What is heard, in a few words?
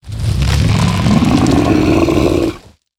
Mountain Giant Enter Play